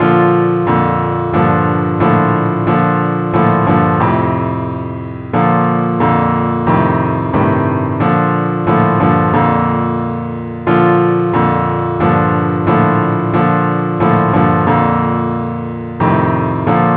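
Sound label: advance acc key b flat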